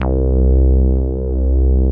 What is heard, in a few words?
SOUL BASS C3, SYNTHBASS